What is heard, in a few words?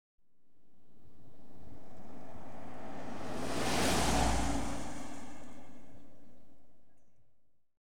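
CAR, Rolls Royce Silver Sprite, Exterior; Transportation; BBC Sound Effects Library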